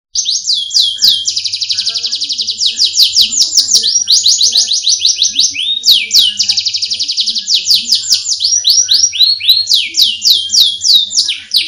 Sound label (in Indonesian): audio kenari jeda 30 detik; perompak